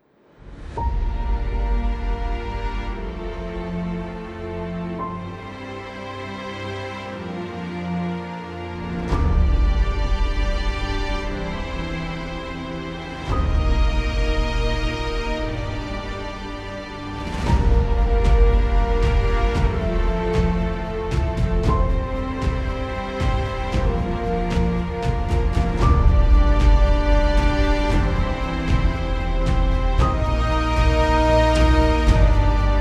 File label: Uplifting Cinematic Trailer; motivational epic music